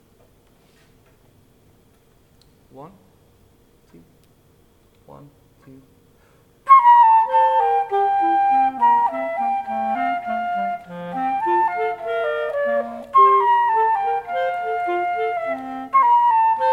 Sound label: fl cl duet allegro